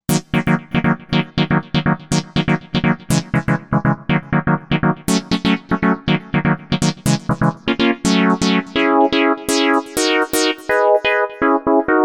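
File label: Lead Minor Synth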